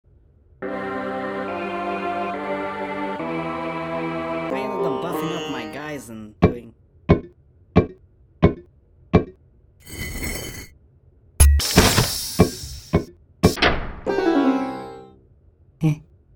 Command Melody; Fleeing The Complex

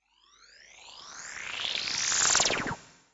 Lightning

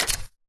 Scroll

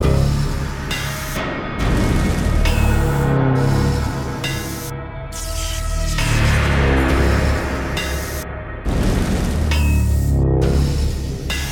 Explore Ambient W Sfx

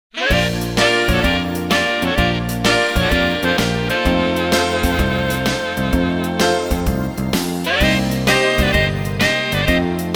epilogue - instrumental; City Hunter Special '99 original soundtrack

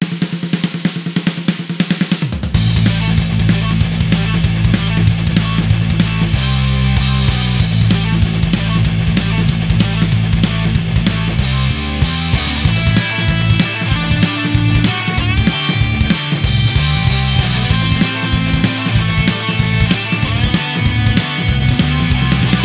Stage3 Metal; Riddled Corpses EX; PS Vita; PS4

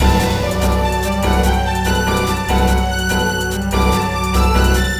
BGM STAGE BATTLE; Phantasy Star Zero

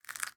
Cookie; miside demo windows gamerip 2023

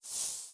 soul pickup; Hollow Knight

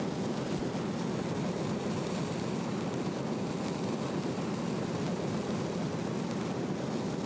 Firestarter Loop; We Are the Dwarves

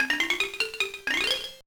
Jingle, gba; Zero Tours

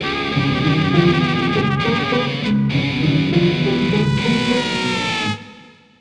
Bari Sax

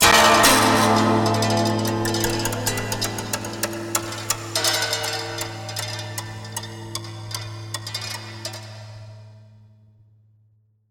music tunnels sewer gate open

